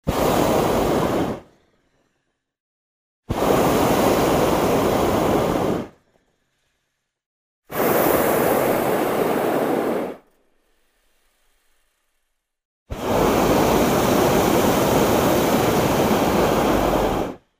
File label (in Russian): vyhlop gorelki na vozdushnom share